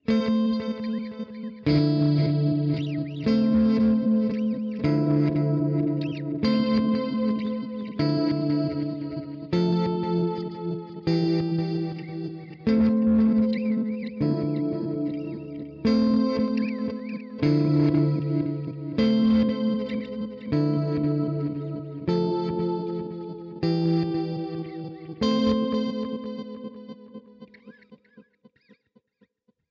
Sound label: Red Panda Raster V2 Shift Detune